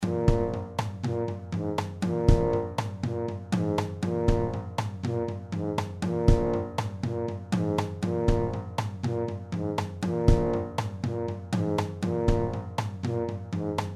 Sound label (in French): tuba 2 tuba 1 batt